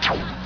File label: remove key